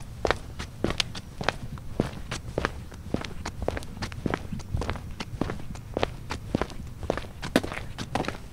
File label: Step Test